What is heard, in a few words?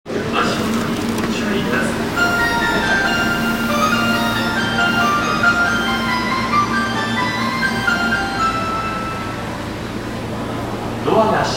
train sounds; 有楽町線月島西